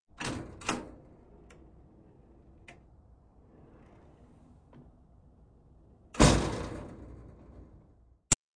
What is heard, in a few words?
Track, Doors; 헐리웃특수효과음; 문 소리